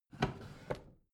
bathroom drawer open regular

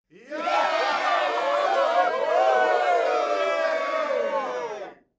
male crowd cheering short

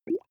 small bubbles single

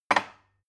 glass put down cupboard hard

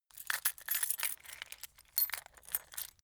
metal keys plastic handling

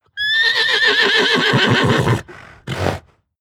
horse vocal attack

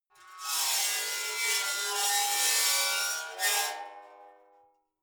metal scrape rich slow

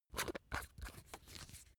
razor battery compartment screw down

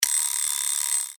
clock alarm

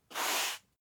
broom sweep slow single

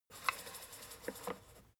fader plastic stutter soft

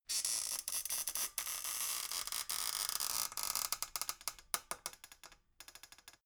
folding chair creak

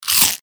eating chew crisp

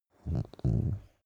dog small growl very soft short

